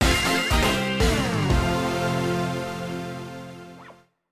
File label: Jingle Game Over; WarioWare: Smooth Moves